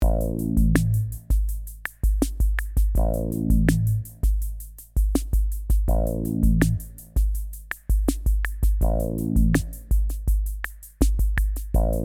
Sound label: Clef Funk